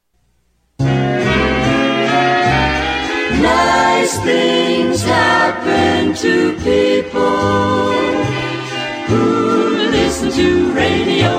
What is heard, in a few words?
Radio Jingle; WNEW AM 1130 NY; Nice